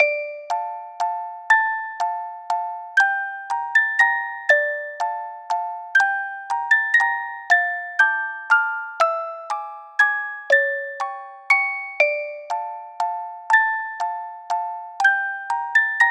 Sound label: Waltz, Music Box